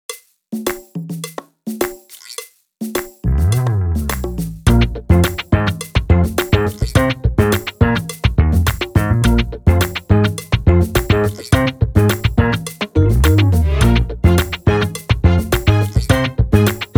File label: I Want that Funk drumless